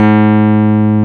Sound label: KEY MKS20 E, MKS20 + EP